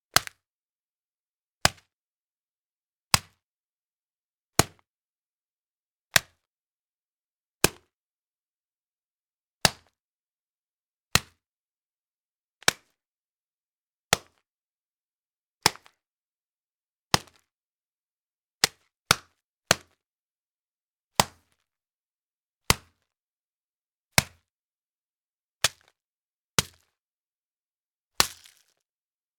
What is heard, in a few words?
Rice Bag Punches